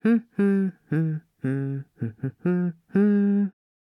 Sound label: voice humming